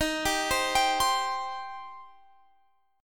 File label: D sharp 6th, Guitar, Standard; D#6; arpeggiated; x,18,17,17,20,20; down; capo=9